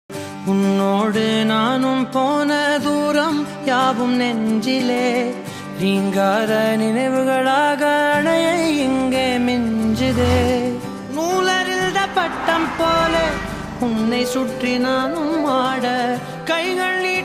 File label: song whatsapp status love tamil